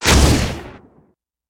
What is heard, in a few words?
Merged Rocket Rocket; In Merged Rocket Rocket